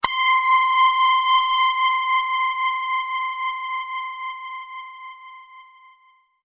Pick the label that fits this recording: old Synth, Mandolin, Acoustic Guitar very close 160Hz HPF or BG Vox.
old Synth